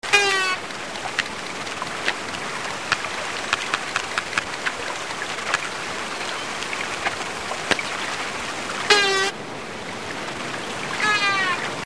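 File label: risso whistle and sonar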